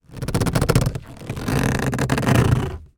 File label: foley plastic grip hand hard movements creaks; zapsplat